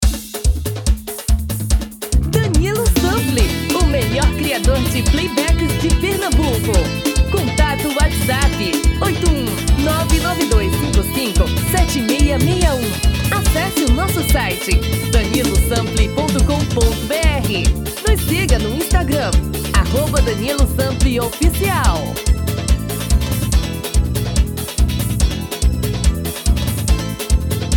Axe

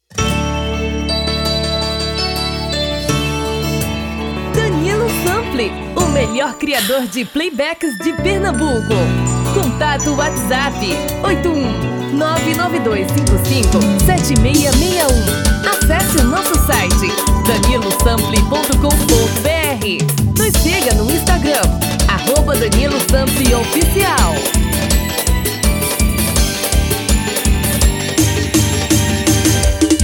ELETRICO tom masculino

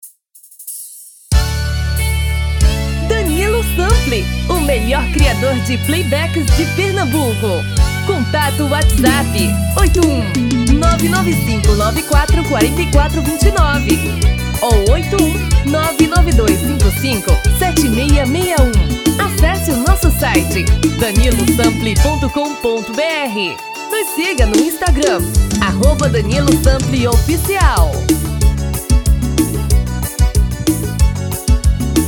Forro das Antigas

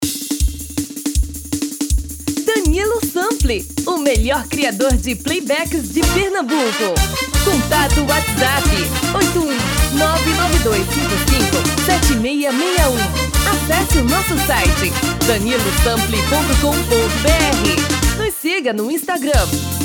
FREVO

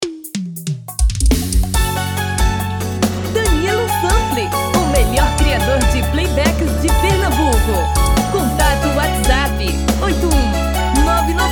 Brega

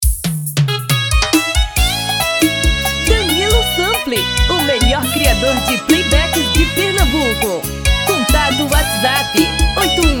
seresta tom feminino

